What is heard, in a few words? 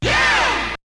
Hit; ZERO G - Datafile DISC 1